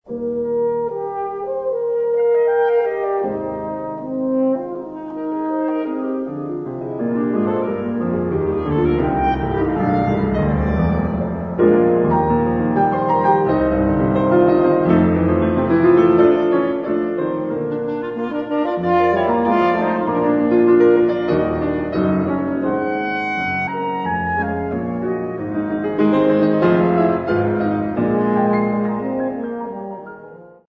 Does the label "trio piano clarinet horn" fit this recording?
Yes